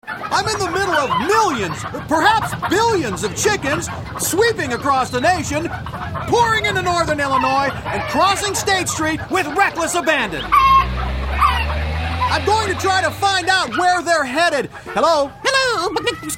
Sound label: thundering chickens